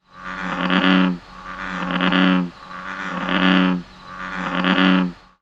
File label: Exterior Random Frogs